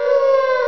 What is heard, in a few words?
looping alarm; CyberNation